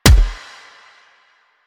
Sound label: Gunbrella Punch, Weapons; Warframe; Tenno; Koumei